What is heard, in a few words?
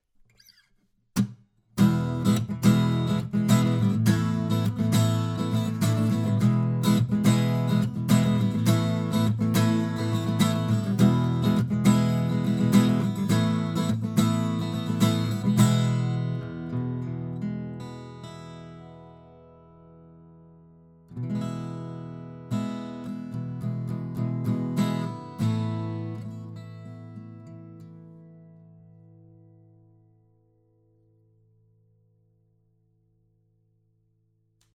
AKG C414 TLII Niere